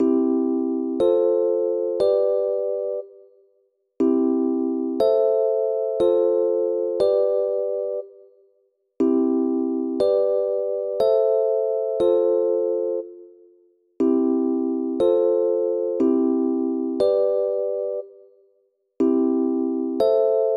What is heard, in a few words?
Basic Western Progressions